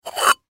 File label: Clay Pigeon Pick Up; Miscellaneous Sports